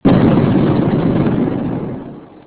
explosie; Netherlands

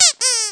Piep; Play&LearnRC

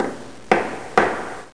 Hamer; Play&LearnRC

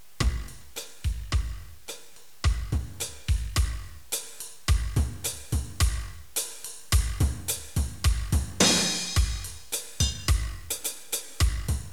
ritme

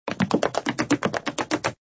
rennen

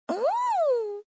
druppel; The Netherlands

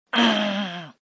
fout groot; The Netherlands

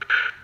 kraak; hooligan

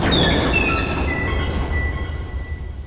klap

